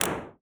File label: shutter mech